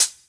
Hhat, Hihat; Equipped Music; Loungin House

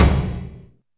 tom lo, Alesis DM5

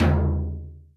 Open Surdo; Zoom RT-123

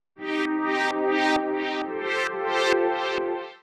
132bpm Lines n Loops Synths, uk garage samples; Solipsa

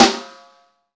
SNR, Snare

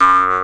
jews harp A; E-MU Formula 4000 Series Vol. 5; Protozoa; Proteus3 Presets

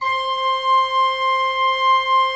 C5, Cathedral Organ; ILIO; Propeller Islands

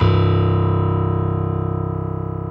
PNO C0, DANCE PNO

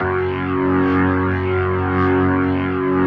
B3, First Call Vintage Keyboards

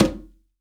CONGA; STEREO CONGA; Cuba

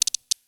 Snappy Riff, Percussion; Sonic Foundry (Sony Creative Software); Futurist Drum & Bass

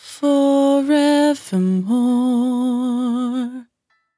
100bpm Bm, Velvet Vocal; Zero-G

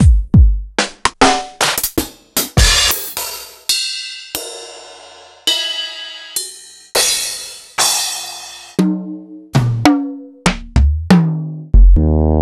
Drum Buzza, proteusvx

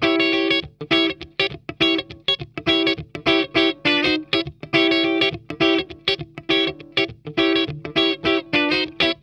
PICKY, Funk Guitar; Zero G - Funk Guitar

